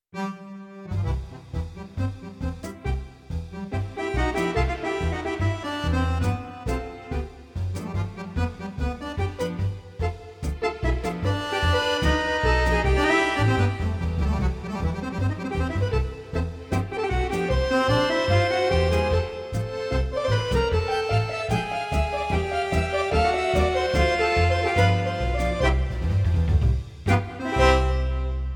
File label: Accordeon met pizzicato